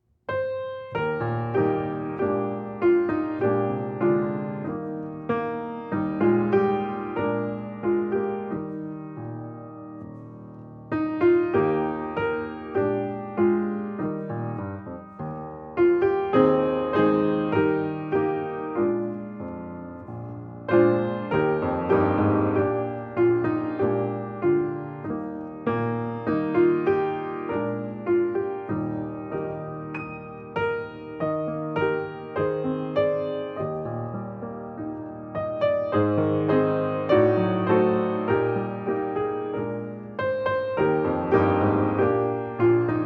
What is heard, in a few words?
Hymn Music